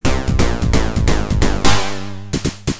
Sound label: Jingle; adlib; Colgate